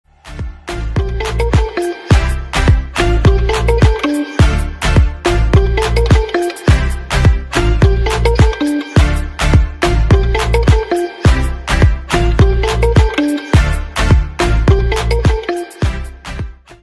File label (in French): Instrumentale